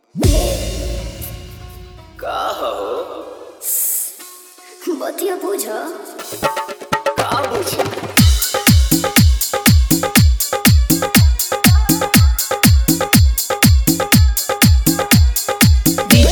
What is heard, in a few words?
Faadu Dhollki Bass Mix